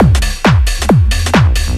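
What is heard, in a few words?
drum loop 135; House; Best Service ProSamples vol.10 - House; AKAI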